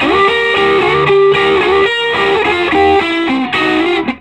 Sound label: SOLO, Vintage Blues Guitar